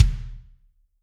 Kick Hard, Drums

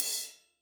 Crash Choke, cymbal; apulSoft apTrigga2; Multi-Velocity Cymbal Samples

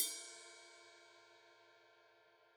Ride, Multi Velocity Cymbal Samples; ZKC 20; apulSoft apTrigga2

